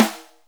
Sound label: Snare, Akai MPC-500; Drum Machines; Toolkits; Snare TKit